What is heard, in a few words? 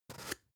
spice jar big scratch open; industrie wirtschaft; gastronomie